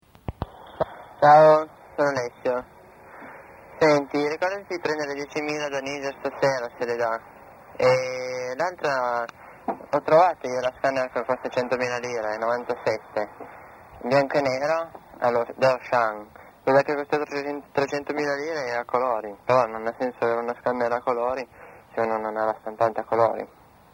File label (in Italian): beat scanner a colori